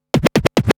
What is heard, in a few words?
Scratch Fills 140BPM